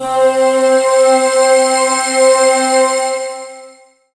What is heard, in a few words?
SYN SY Voyager C5; Best Service Gigapack 1; SYN DIG4